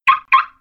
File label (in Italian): allarme blocco auto